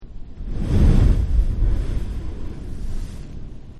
Whale Blow Hole; Whales; Animals

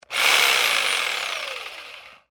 Jig Saw Dry Burst; Saws (Sound Effects:Machines)

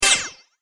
Zap Magical Wand; Magic Sound Effects; fantasy sound effect; Andrew Fleming

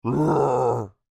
Creature Speak Moan Upset, Human; Groaning; Voice

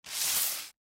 Sandwich Wrapper, Sandwich Wrapper Slide Paper; Food Sound Effects; Foley; Cheese Burger Fast Food Restaurant; Blastwave

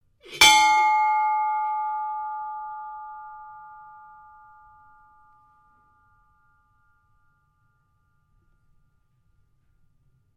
SHIPS BELL DUMB HOPPER BARGE RING; 10 1885; Various ships bells; Boats; Vehicles

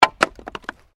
Wood Drop; Material components for constructing more complex sounds; Sound Effects:Materials

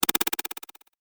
Vibrating Decay; Miscellaneous Cartoon Sound Effects

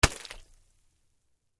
Splat Wet Drop, Sponge; Texture; Drops (Sound Effects:Objects); BlastWave

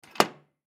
Hotel Room Door Interior Unlock; Miscellaneous Door Sound Effects; Foley; Blastwave

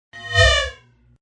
Alarm Hit; Multimedia

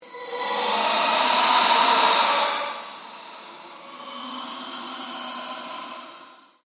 Ambient Drone Ghost Chatter Scream; Scary Soundscape; Sound effects created by manipulating other sounds

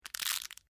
DRY BRITTLE SNAPPING

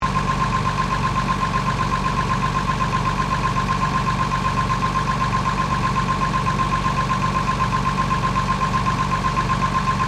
Truck IH 1969 C1800 Idle

